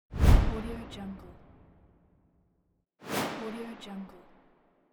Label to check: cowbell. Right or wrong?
Wrong